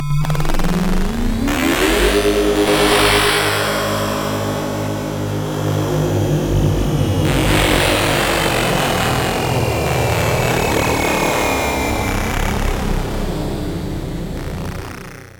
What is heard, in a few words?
space sound; Synthesis